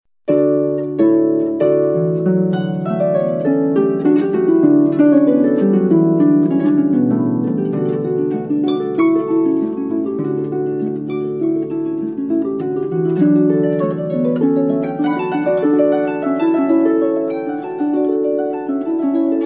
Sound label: Solo, harp; classical; Parry: Harp Sonata